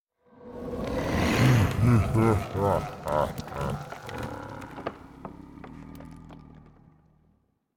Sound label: Voice Laugh; Candlegeon Voice Laugh